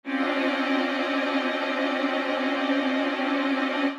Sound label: Strings Trill, cinematic drama Soundbeds; musicradar